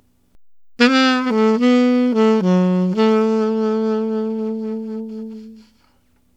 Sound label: Alto Sax, Alto Loops, Jazz Solos and Sections, Traditional; Sonic Foundry (Sony Creative Software)